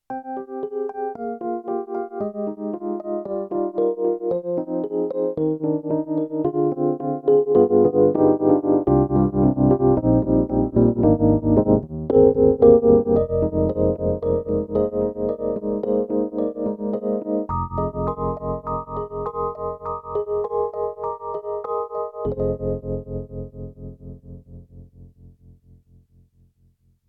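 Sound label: SD-80 Tremolo Rhodes; EDIROL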